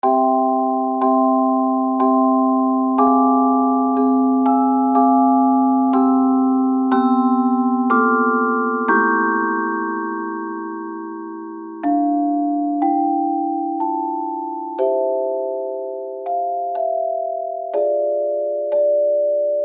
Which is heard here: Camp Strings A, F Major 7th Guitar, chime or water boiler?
chime